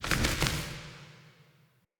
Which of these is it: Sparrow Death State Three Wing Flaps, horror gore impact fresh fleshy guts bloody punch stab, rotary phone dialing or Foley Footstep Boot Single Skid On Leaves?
Sparrow Death State Three Wing Flaps